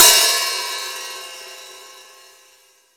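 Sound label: open hi hat, 14 inch old hi hat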